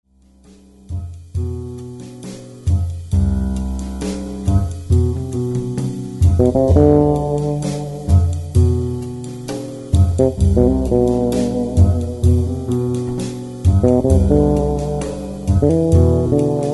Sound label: Rain Drops